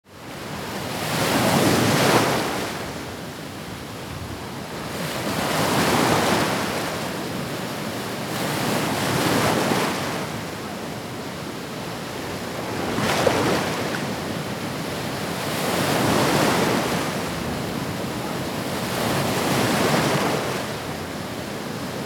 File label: Beach ambience waves breaking